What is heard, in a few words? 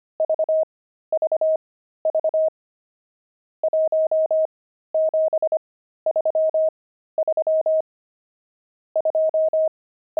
pangram, intermediate pangrams files